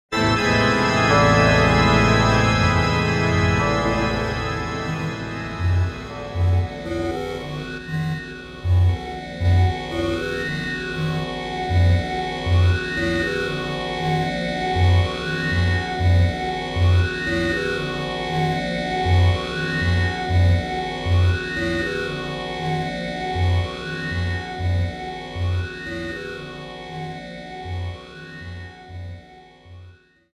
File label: Jingle Stage Failed